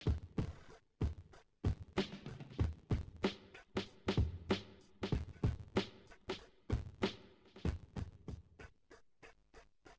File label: wdrums inst sim; SASSEC07